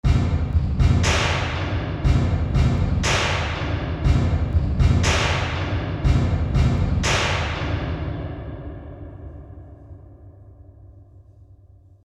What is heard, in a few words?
Perc thing